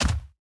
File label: footstep; Smite; god Khepri